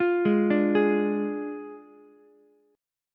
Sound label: D Diminished D, Ukulele; arpeggiated